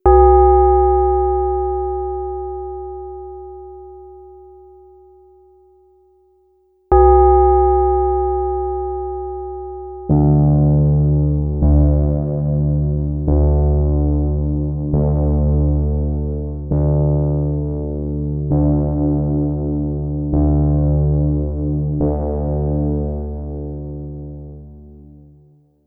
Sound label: Music woods